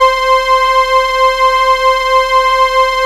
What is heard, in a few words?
SIEL, String Machines